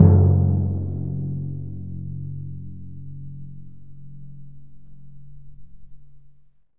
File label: DRM, Orchestral Perc; Roland LCDP03